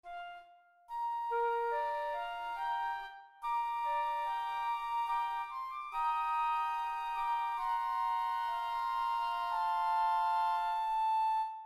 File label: Fantasy for Flutes